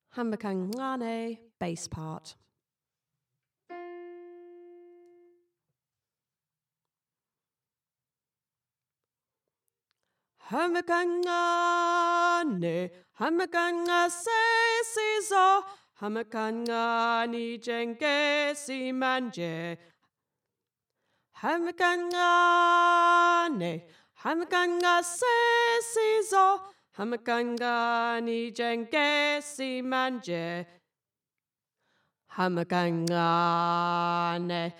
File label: Bass; hamba ka ncane